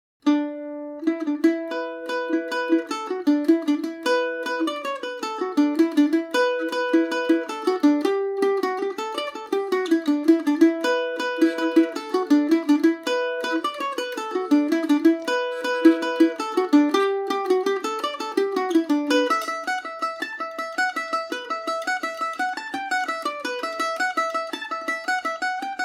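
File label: E dorian, Irish mandolin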